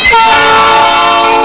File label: diesel horn